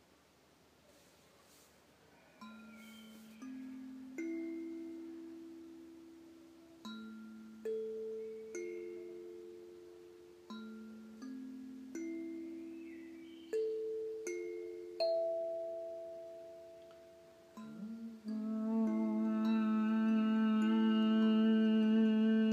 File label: Maha Mrit Mantra